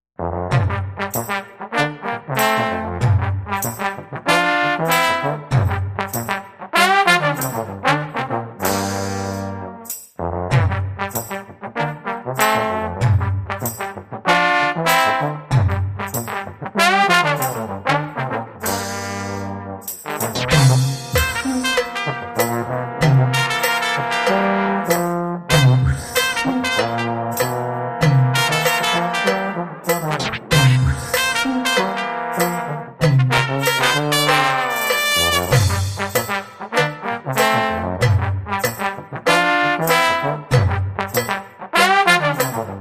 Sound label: Bavarian Funk Unplugged Version